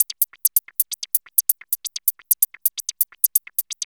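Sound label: HAT loop; East West - Phat & Phunky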